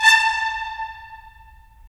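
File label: brass trumpets staccato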